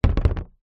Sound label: foley brick piece small set down on plastic